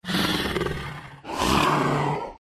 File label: Two headed Dog Stun